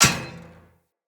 Path Lantern Impact, Hanging Lanterns; Dendrit

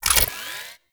Shotgun Quick Reload Layer; Pax Duviricus

